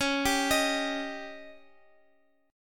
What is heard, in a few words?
Db Suspended 2nd Flat 5th, Guitar; arpeggiated; JGuitar